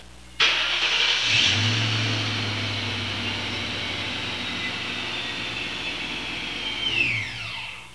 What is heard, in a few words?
startup; '98 Procharged GT; Start Up 3; The exhaust is stock with a set of 2-chambered delta-flow flowmasters.